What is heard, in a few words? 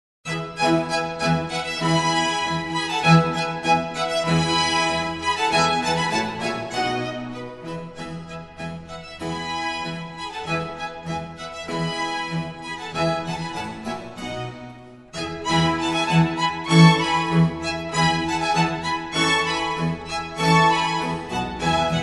concerto violin string; vivaldi; op8 no1 mvt1